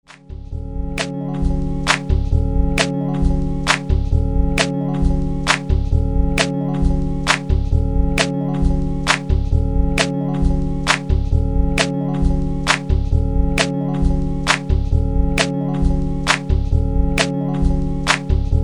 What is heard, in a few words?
Loop; SOMETHING VINYL SERIES